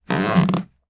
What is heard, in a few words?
Creaking Wooden Floor